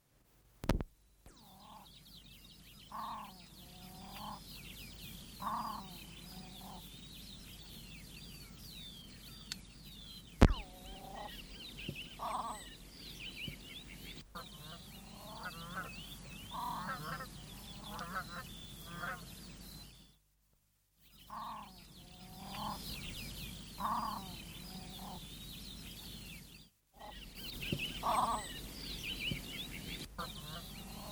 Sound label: southern crested grebe song; conservation, native animals, birds, bird song